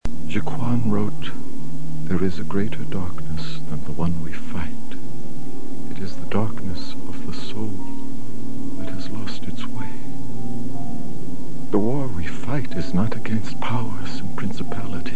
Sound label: baby